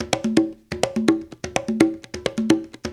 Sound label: TAP, African Tap; Spectrasonics; Supreme Beats